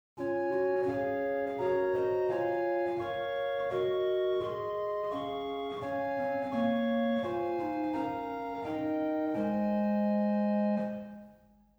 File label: Rohrfleute 4, organ stops; Reinhardtsgrimma